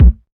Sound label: perfect bass drum single shot a sharp key